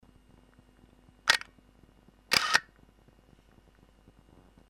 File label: X-1 MOTOR, camera